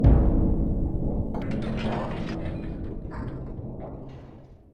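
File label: garbage chute; lucius ii the prophecy gamerip 2015 pc macos linux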